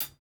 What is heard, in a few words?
hihat, house